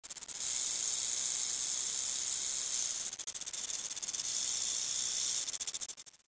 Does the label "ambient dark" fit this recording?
No